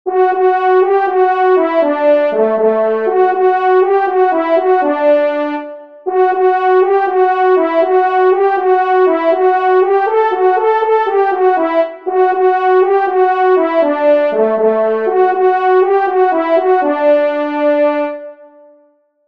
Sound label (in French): Sanglier Solo, trompes